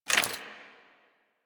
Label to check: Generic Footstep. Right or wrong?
Right